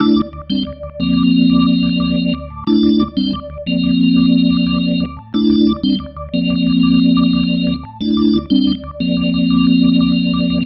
Pattern, Organ

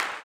CLAP, Blues Soul; Spectrasonics Vocal Planet

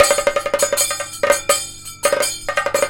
KATAK, Indian Traditions; USB Soundscan vol.45; AKAI

